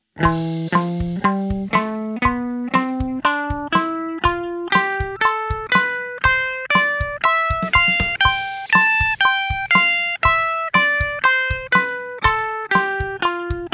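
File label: Am #5 Phrygian, 120 bpm, quarter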